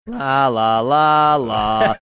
singing part theme; daria

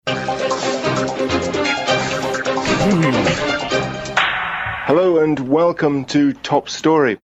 funny sound made during top story drop

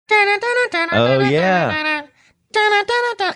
singing some chords

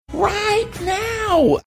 singing right now

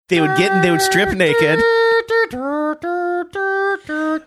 mouth trumpeting

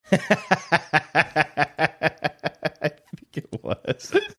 laughing saying i think it was

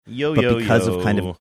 singing yo yo yo

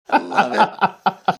chuckling

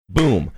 hand clap saying boom

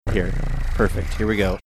making drum roll sound

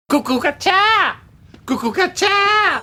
chicken sounds; george bluth sr

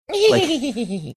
trying imitate dogs laugh; duck hunt

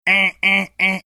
mimicking digital alarm clock

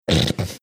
snorting

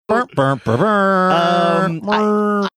making price is right losing horn

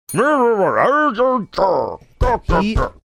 mumbling grunting pounding

